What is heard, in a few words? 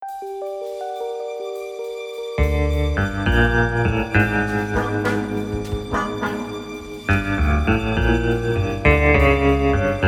Sting; CounterSpy; Android, iOS, PS Vita, PS3, PS4